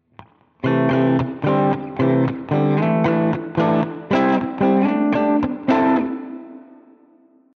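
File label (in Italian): Esercizio, ARMONIZZARE la pentatonica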